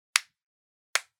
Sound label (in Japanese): 二拍手